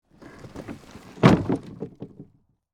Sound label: Impact Wood Plywood, Drop, On, Plywood; Wood (Sound Effects:Materials); BlastWave